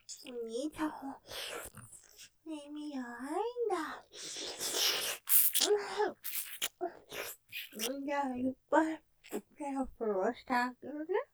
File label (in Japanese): 舐め◆お兄ちゃんの耳弱いんだｗぺろぺろ; ステレオ